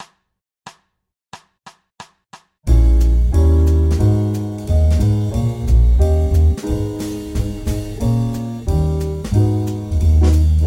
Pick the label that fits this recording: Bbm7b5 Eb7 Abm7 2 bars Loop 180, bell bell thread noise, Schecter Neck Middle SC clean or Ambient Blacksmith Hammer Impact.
Bbm7b5 Eb7 Abm7 2 bars Loop 180